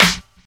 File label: sparkle acoustic snare sound f key, acoustic snare tuned to the F note; snare-drum-samples